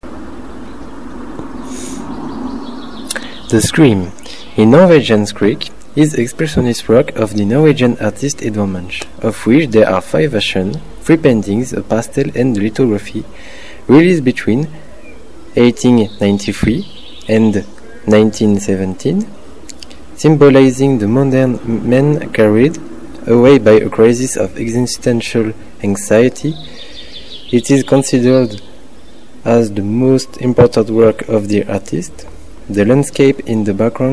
the scream

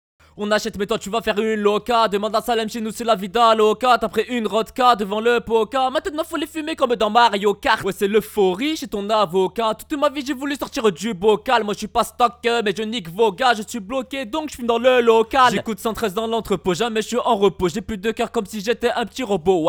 lead bip

